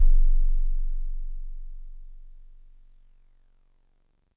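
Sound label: DreamLike DX100 F sharp -1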